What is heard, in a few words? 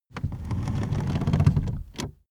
car seat slide forward slow